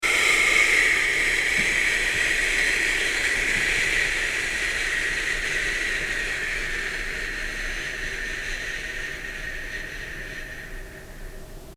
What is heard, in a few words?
Six Healing Sounds Qigong 噓 XU